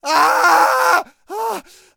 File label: npc male scream first; DeadFright